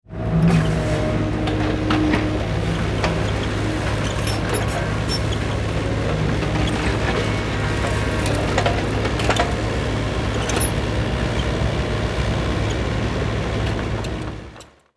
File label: Excavator construction vehicle working